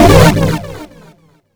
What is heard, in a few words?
blow, electrical